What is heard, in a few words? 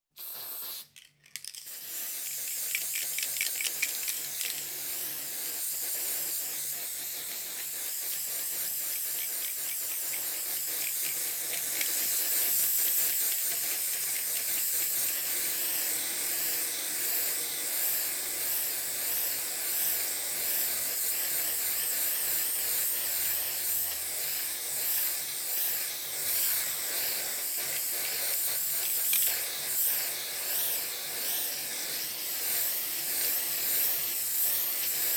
Spray Painting; Construction; BBC Sound Effects Library